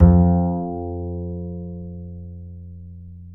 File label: F 2, Bass; Spectrasonics; Bass Legends